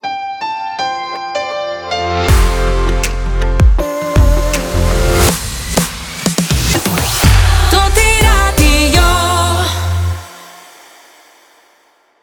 Transition Slow Fast; Toti Radio 2023

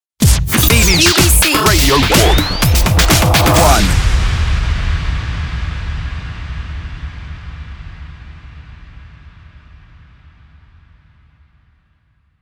Station ID, jingle; BBC Radio 1

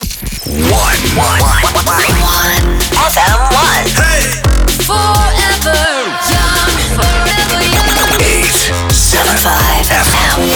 Jingle 128bpm Fm Main ID; 87.5 FM1 Manila

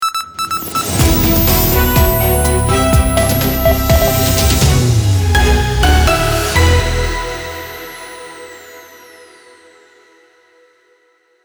News Opener 124bpm Main ID, jingle; Warm 98

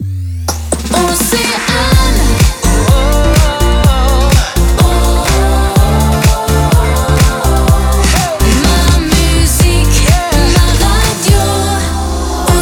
Jingle; Océane 2022

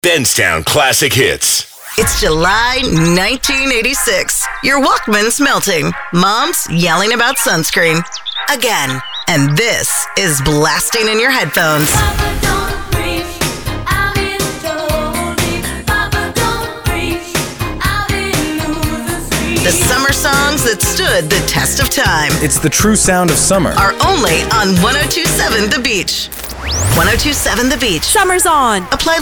Classic Hits Composite

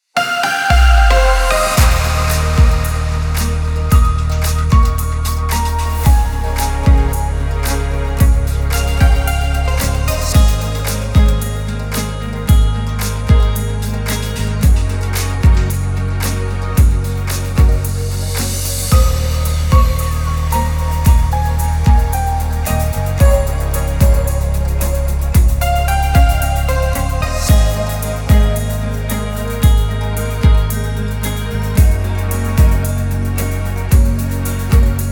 Radio Weather; Toti Radio

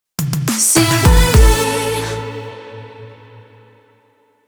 Jingle 104bpm A Rapid Fire

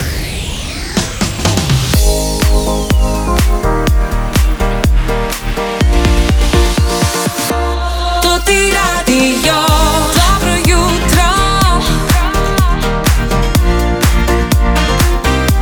Radio Opener Morning Show; Toti Radio

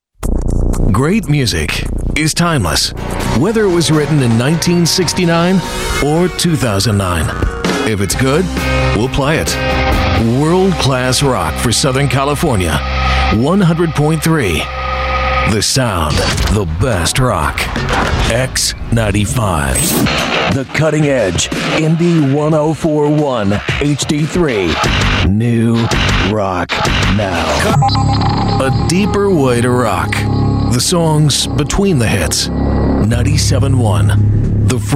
AAA ROCK

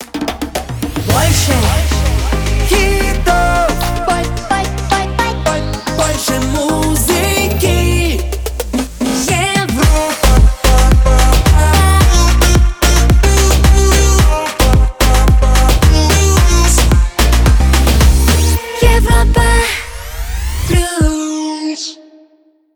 Jingle; Europa Plus

